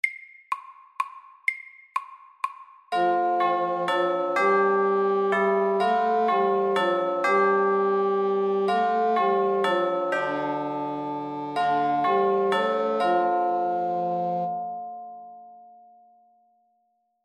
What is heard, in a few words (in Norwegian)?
Klokkekling FORT